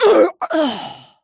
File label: Female Dwarf, Death